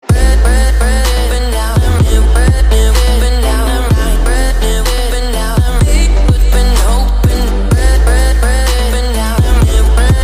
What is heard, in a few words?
Trap Remix